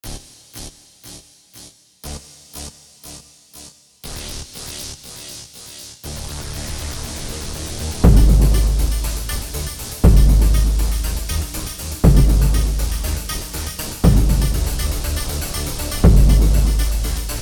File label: Frozen Ocean